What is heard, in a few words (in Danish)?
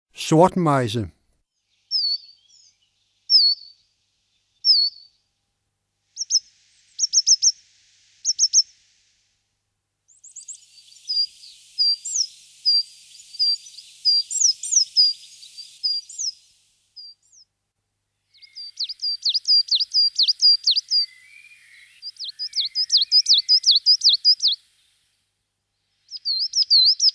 Sortmejse